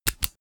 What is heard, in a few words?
pen open